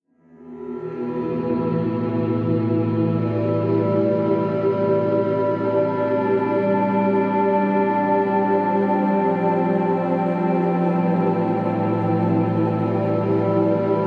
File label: Ambient New Age Theme